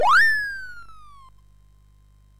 sid MOS6581R2; Golden Axe

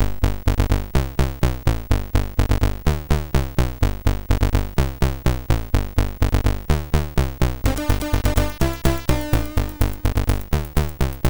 Rainy Days, sid MOS6581R4

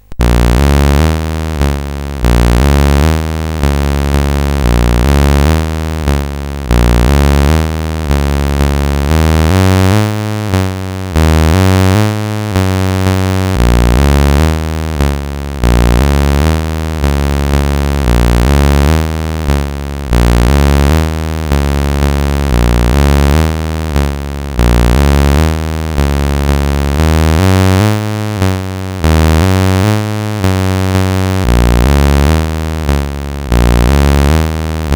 Jazz Bass Line, CSG8580R5

